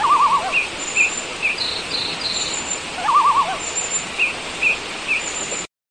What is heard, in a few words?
Water Birds Natural Sound